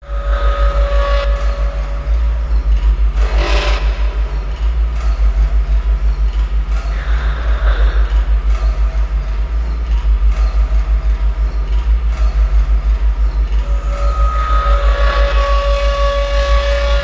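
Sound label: Machine Room Ambience Industrial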